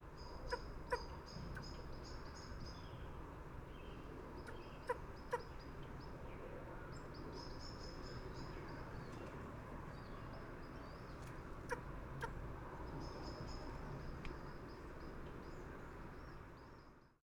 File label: Long eared Owl Very quiet barking calls at roost